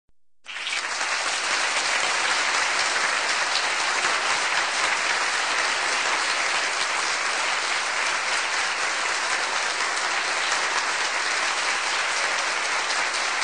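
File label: Applause 80-100 people; Aplodismenty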